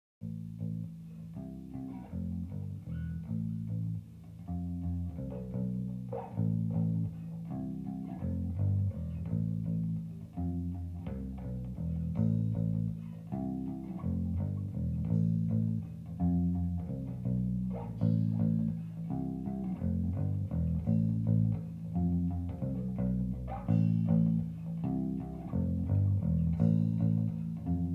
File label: SM57 Bass